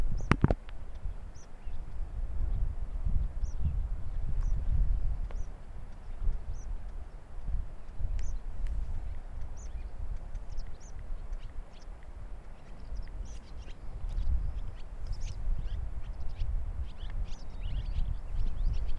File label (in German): Bergpieper Rufe im Flug Cape Greco